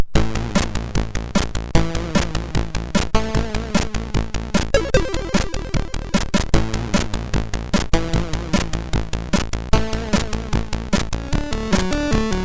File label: sid, MOS6581R2